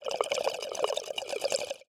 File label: tone; seasonal